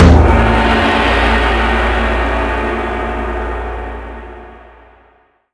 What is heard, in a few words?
gong; ragemap2019